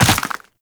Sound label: Land Ice, Footsteps; Warframe; Tenno